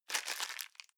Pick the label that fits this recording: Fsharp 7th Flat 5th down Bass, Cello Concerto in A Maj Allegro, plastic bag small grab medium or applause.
plastic bag small grab medium